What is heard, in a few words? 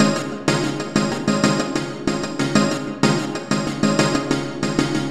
Beat, 94 bpm